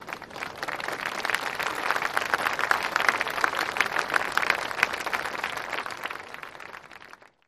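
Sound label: Applause Small Crowd Outdoor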